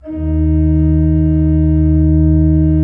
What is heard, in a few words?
D1, Cathedral Organ; Propeller Island; ITAL.PRINZIP